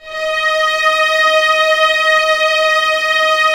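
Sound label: violins, orchestra; String Master Series; Vlns 7 Orch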